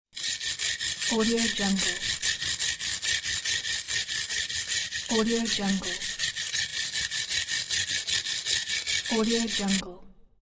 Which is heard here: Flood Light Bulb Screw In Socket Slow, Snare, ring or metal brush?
metal brush